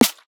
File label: snare w foley